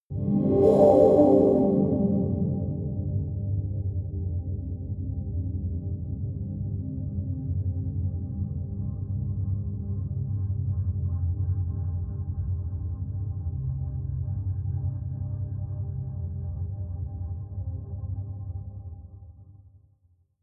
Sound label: pad sweep lowpass; flaechen